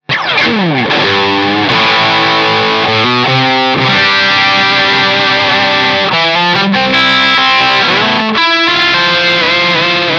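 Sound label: Modern Dist Suhr Tele Rock Rhythm, guitar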